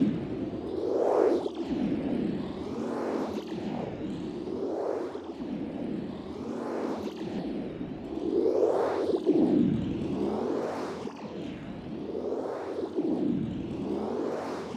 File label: float; crosscode